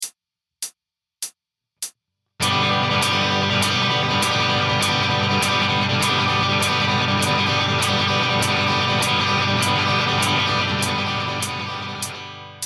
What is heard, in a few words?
chord rokuren, guitar